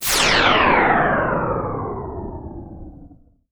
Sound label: Down the shaft